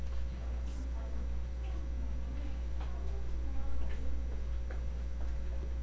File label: gun